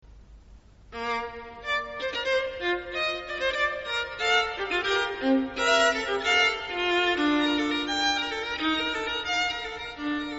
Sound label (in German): fantasie in b-dur